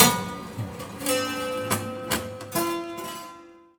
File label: Prepared Piano; Battery 3 Sample Kits